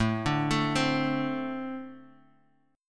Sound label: A Minor Double Flat 5th, Guitar Standard; Ambb5 arpeggiated; 5,5,7,5,x,x; JGuitar